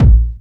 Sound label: BD, Drums Dance & Groove; USB Soundscan vol.11; AKAI; ALL BD